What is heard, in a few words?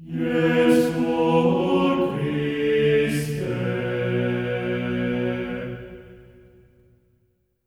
JESU B3, Symphony of Voices; Spectrasonics